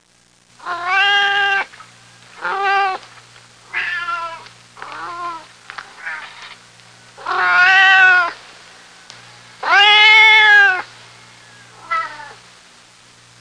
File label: Meowing cats long